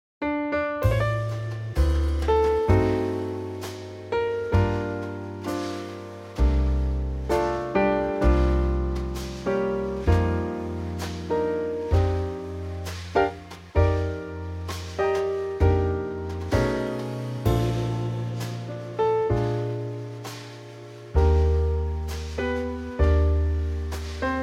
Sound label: Bb Trio; key - Bb - vocal range - C to F